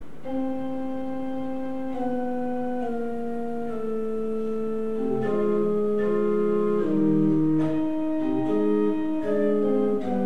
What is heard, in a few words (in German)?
Fugenfragment c Moll Dur 6 Takte; mozart